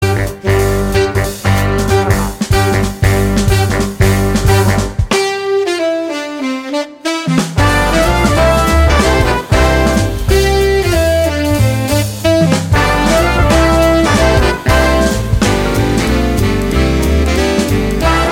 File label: Big Band Deck The Halls instrumental